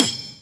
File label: Percussions